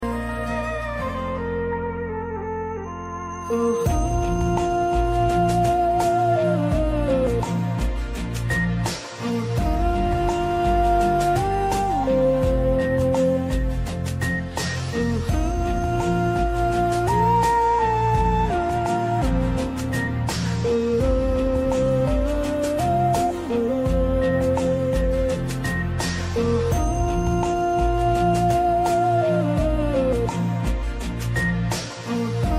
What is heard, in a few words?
Instrumental Tone for Mobile